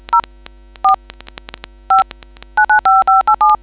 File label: dtmf